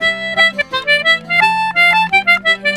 C4 R, Celtic Flavours; USB Soundscan vol.42; AKAI

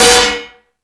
Rap Session, Drum Machines

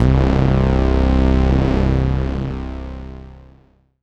BASS That Bassdrone; Polyend; Plughugger

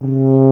BRS TUBA, Solo Instruments

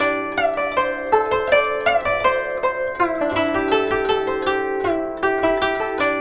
GUCHIN 90 C; INIS - Opium

